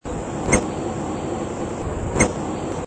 voz saíra de antifaz

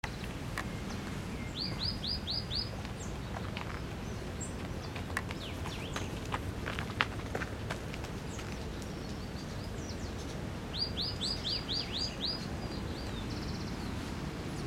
tacuarita azul

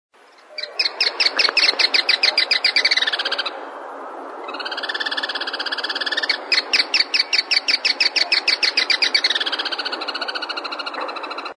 curutié ocráceo

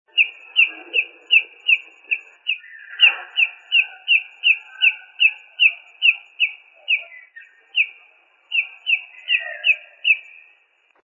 voz pitotoy chico

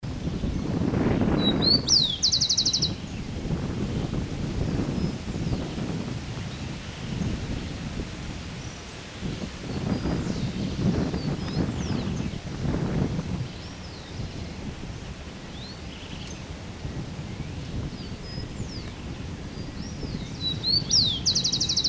chingolo

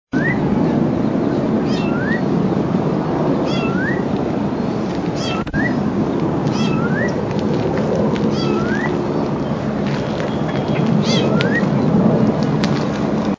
zorzal chalchalero, voz

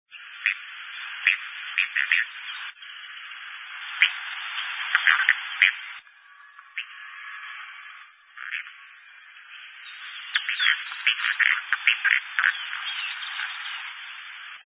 voz pollona pintada

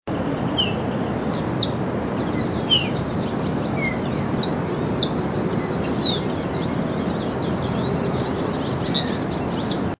voz tordo músico

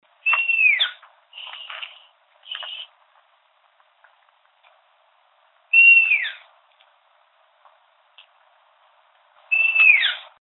Lechuzón orejudo; Diego Bastías

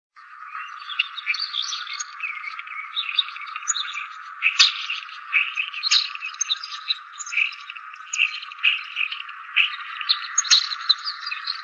voz estornino pinto juveniles bandada

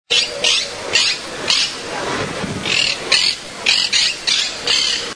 voz cotorra

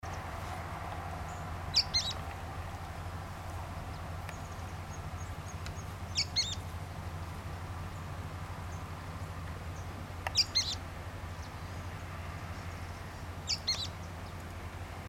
pijuí frente gris

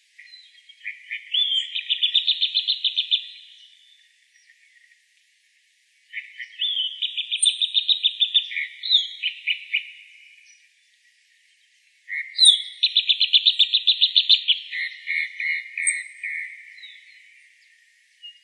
calandria, voz

